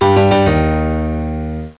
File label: error